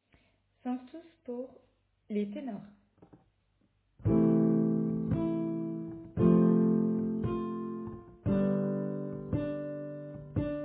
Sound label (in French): Sanctus Messe Saint Francois Xavier Tenor